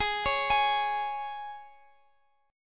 C sharp 5th, G sharp, guitar chord

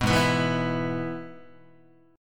Ab Minor Sharp 5th chord, Guitar; Abm#5 strummed; JGuitar

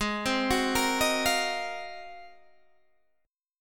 Ab 13th down Guitar DADGAD; Ab13 arpeggiated; Ab13 Chord

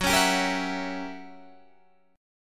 Gb 7th Flat 9th Gb Guitar Open D

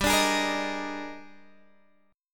Eb Major 11th G

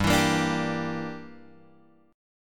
G Minor 6th, Guitar Drop D

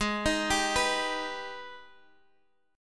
Ab Minor Major 7th Ab, Guitar DADGAD; x,11,13,12,14,x; down

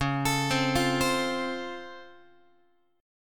C sharp Augmented Major 7th down Guitar, chord; x,9,12,10,9,11; arpeggiated; JGuitar

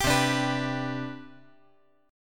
C Major 7th Suspended 2nd C down, chord

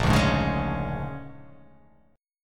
Bb 7th Sharp 9th, Bb7#9 chord; strummed; JGuitar

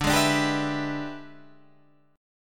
E 7th Sharp 9th D, chord

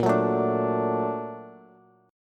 Bb Augmented Major 7th Bb, Bass; Bb+M7 strummed; JGuitar

